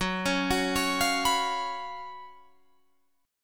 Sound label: Gb Major 7th Suspended 4th Gb Guitar Drop D